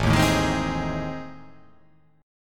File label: A Minor Major 7th Flat 5th